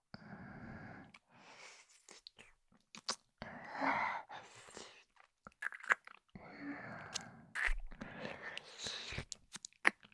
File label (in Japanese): ゾクゾク首筋舐め